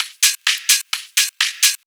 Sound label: Hihat Loop 128 BPM